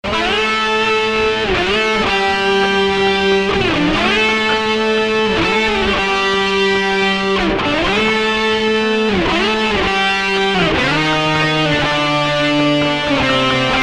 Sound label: Guitar